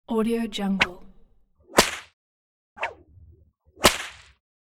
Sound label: bullwhip cracks and whooshes twice